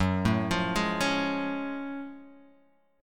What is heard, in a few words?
Gb Minor Major 9th Gb, down, Guitar, Open D